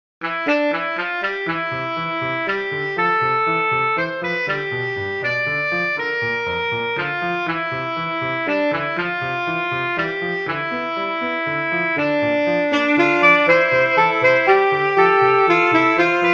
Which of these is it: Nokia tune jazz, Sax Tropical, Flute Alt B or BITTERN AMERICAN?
Sax Tropical